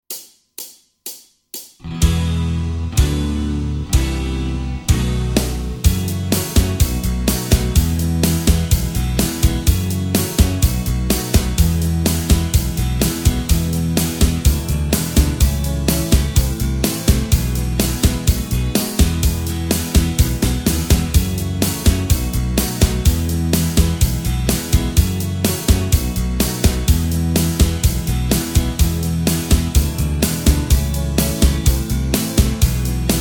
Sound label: (E) No Lead Guitar